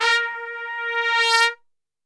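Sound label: A#2 trumpet; Best Service; Brass Super Section; TRP SWL; 90 s Sample CDs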